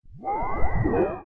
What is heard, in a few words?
giant arm action, machine